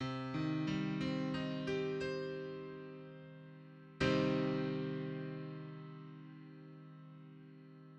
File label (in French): Accord do mineur arpege puis plaque